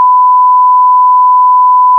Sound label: Bleep